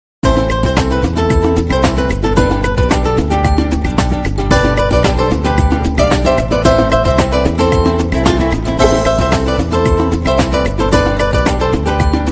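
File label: latin guitar; ringtone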